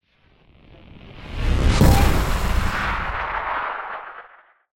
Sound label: electricity blast; SoundsCrate